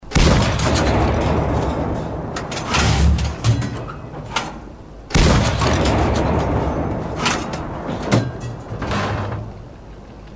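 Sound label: shots of a 76mm gun; US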